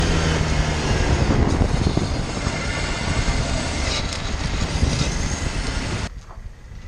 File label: brake grinding